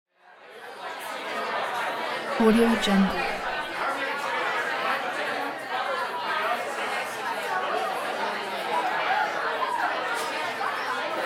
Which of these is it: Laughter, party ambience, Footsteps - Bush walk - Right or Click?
party ambience